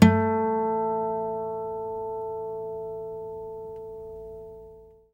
NYLON HARMO

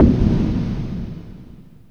POWDER BAL, PERC; NorthStar - The Wizard VOL-2; TSAR PERC

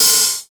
snare+hat; DRUMS

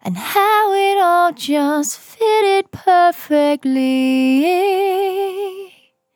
On A Star 65bpm F, Vocal; Zero-G

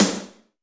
Snr Gunner, Alesis D4

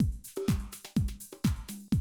125, Classic Drum Loops

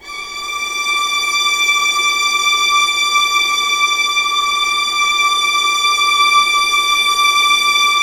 D4, Ultimate String Collection, VLAS EXP; LEG

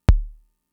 kick, Fricke MFB-522

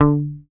HOFNER MUTE, Bass

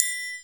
TRI, triangles; Univers Sons; Basicussions

